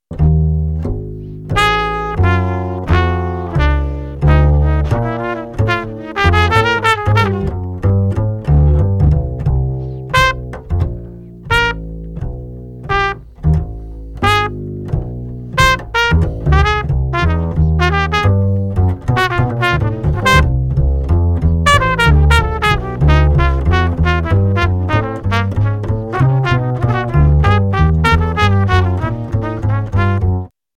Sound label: free bass